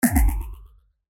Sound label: Phaser